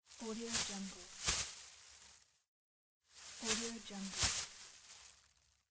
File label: shake thin plastic bag